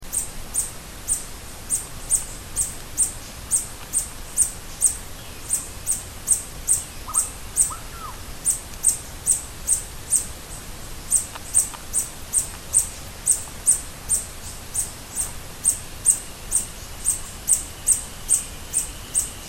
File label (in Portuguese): Som beija flor de banda branca